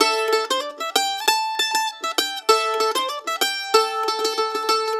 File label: LICK, Blues Guitar; ILIO; Fretworks - Blues Guitar Samples; 96 CEE LICK